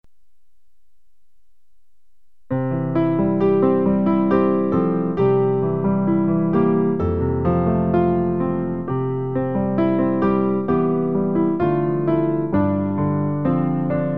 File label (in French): piano